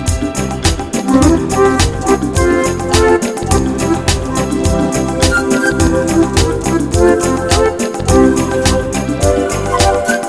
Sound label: Smooth Reggae